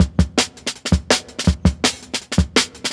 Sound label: 164, Jungle Breaks; 90s Sample CDs; Original Jungle Breaks